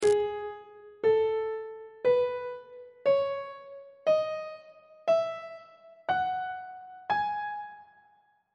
g sharp phrygian mode note positions on treble clef